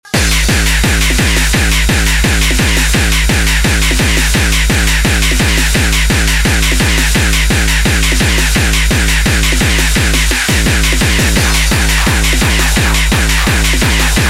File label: Drummachine